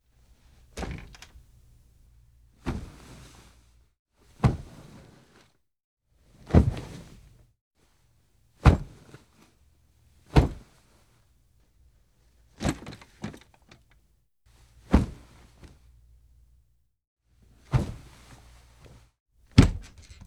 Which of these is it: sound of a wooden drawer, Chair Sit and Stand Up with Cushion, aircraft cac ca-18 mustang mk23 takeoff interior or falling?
Chair Sit and Stand Up with Cushion